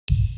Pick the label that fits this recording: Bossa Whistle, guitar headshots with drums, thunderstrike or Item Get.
Item Get